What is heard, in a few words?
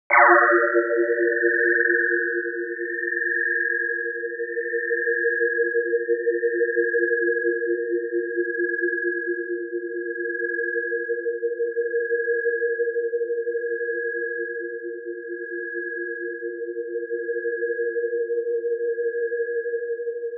trumpet; graz